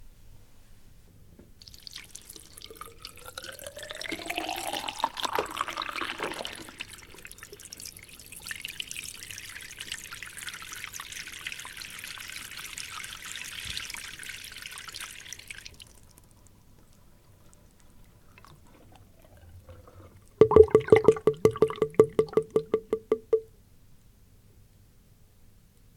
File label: Basin input output